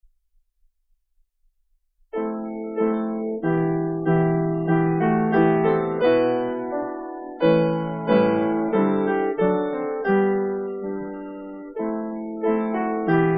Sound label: carol